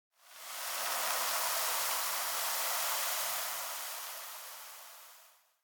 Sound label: Sand Gust; Warframe; Clan Dojo; Dojo Randoms; Ambience